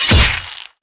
Sword Massive Hit

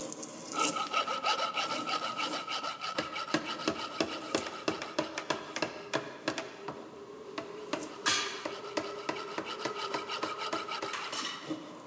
Amb Engin; Eve

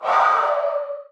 Ghost Attack

